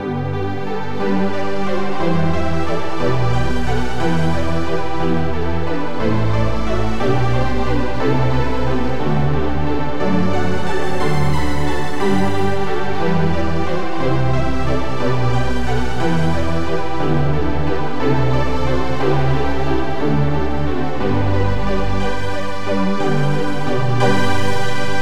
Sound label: brightness